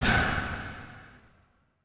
fallen projectile

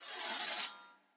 ambience room polygon cut training

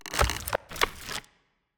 tree golem little strike; Shelter

